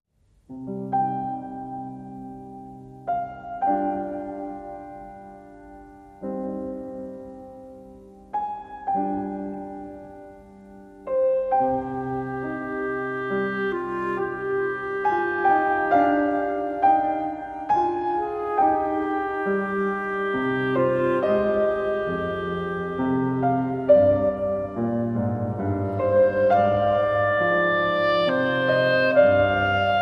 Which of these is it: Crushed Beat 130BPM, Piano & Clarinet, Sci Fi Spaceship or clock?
Piano & Clarinet